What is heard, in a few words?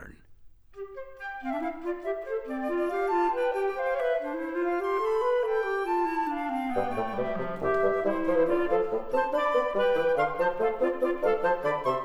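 XY Cardioid Technique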